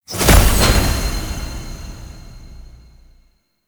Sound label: Boon Fragment Pickup; Duviri; Warframe; Pickups